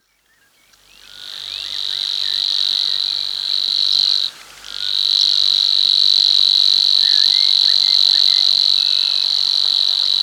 Savi's Warbler

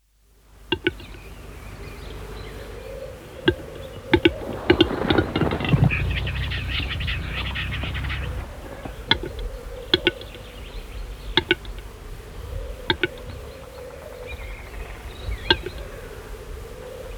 Capercaillie, birdsong

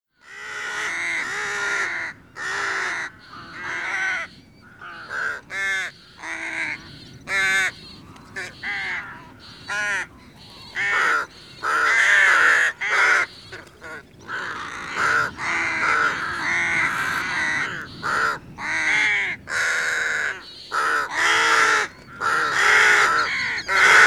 Rook

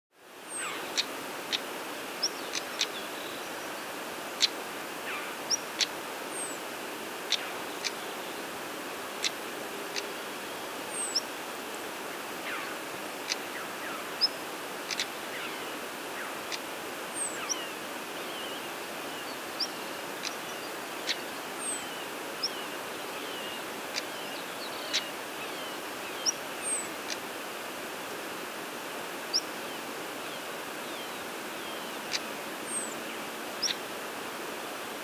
Stonechat

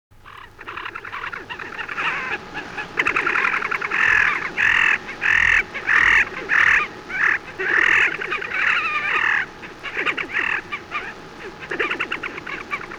Slavonian Grebe

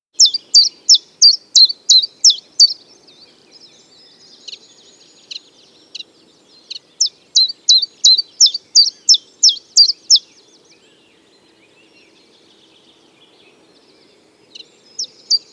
Chiffchaff, birdsong

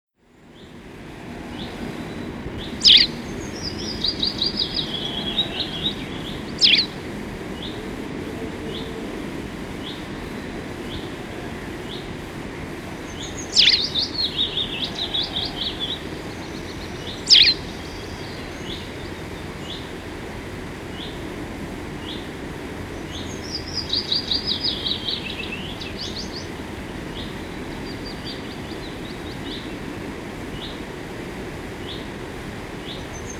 Pied Wagtail, birdsong

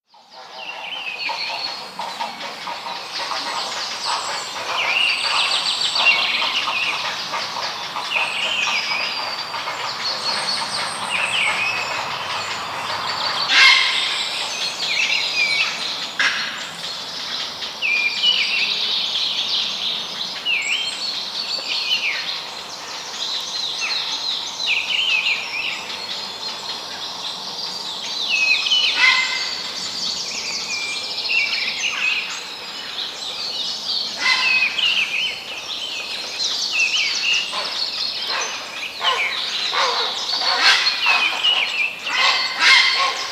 Grey Heron

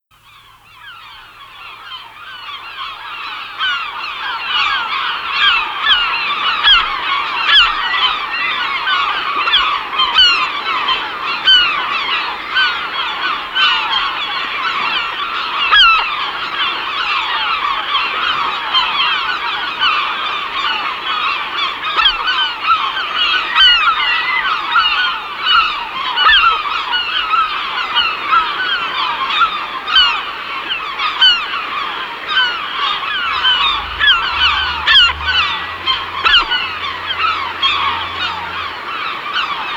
Herring Gull, birdsong

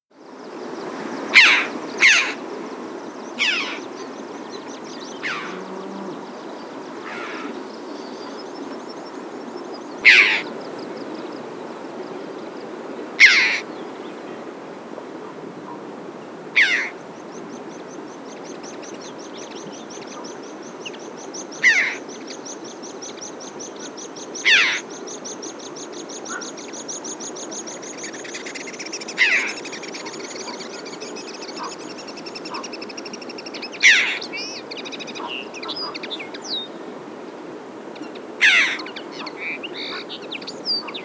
Chough

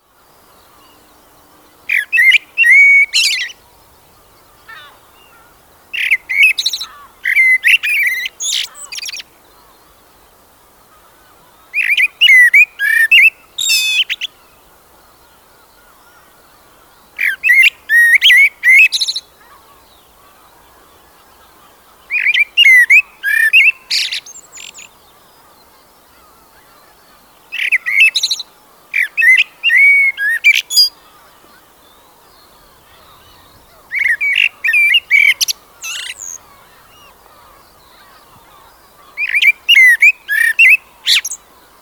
Blackbird

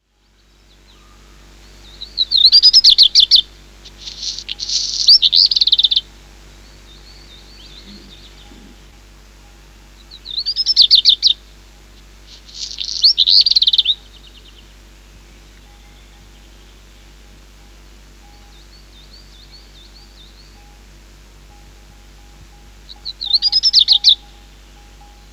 Black Redstart, birdsong

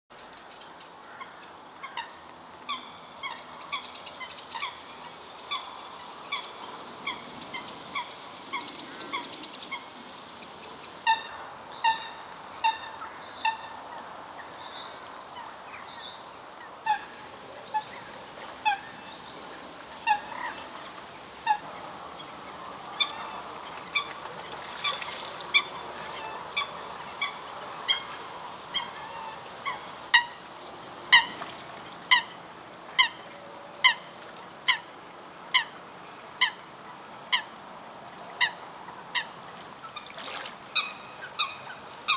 Coot

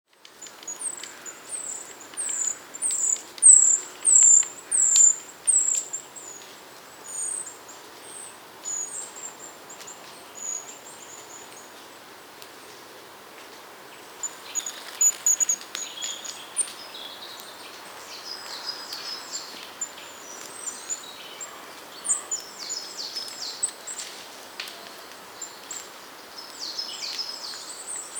Treecreeper

